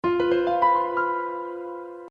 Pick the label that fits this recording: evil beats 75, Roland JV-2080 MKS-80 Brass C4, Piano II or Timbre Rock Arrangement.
Piano II